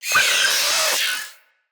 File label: Root Spider Elite Vocal Death, spider; Animals; Spiders; Vocals